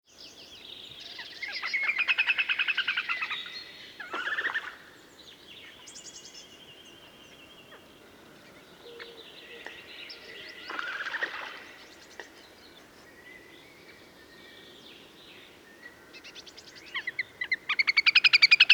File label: Little Grebe